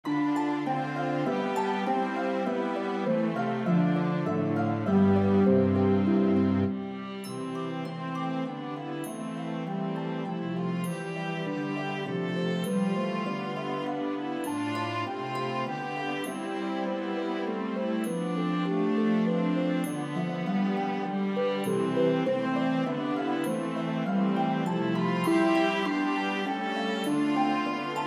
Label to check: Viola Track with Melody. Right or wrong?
Right